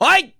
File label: "OI", SHOUT; 1000fx